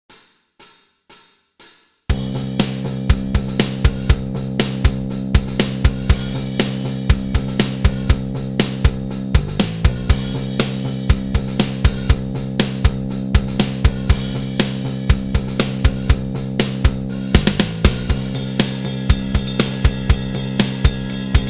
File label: Improvisation